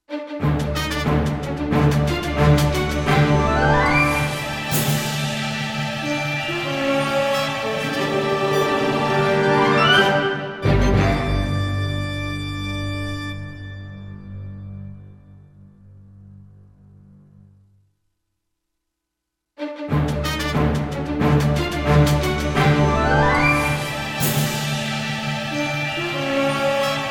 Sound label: Combat Win